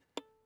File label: Violin pizz mf sul E A7